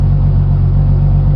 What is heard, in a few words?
engine; libgdx